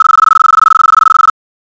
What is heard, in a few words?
phone